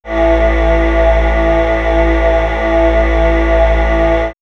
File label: Swirls Subhuman, pads; X-Static Goldmine